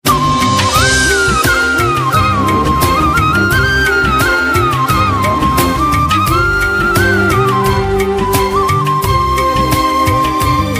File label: love bollywood ringtone